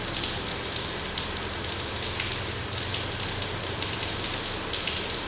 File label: water pour; Star Wars Knights Of The Old Republic I; XBOX